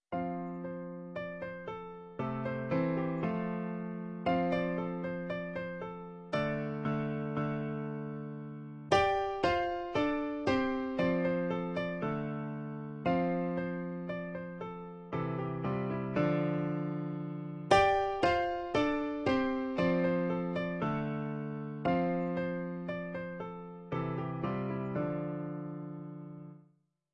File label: bamboo flute